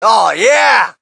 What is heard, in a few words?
crowd, shout; Mike Tyson Heavyweight Boxing